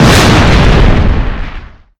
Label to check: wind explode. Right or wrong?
Right